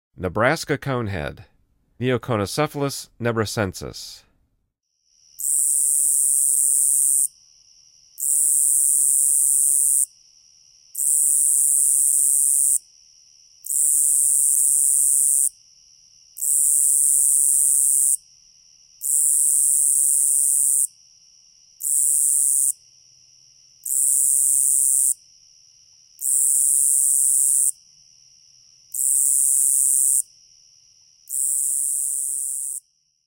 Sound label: Nebraska Conehead, Insects; The Songs of Insects